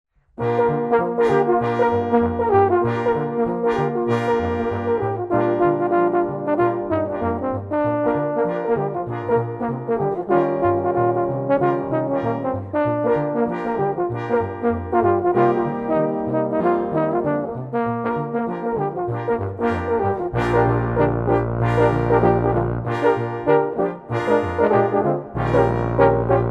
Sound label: Euphonium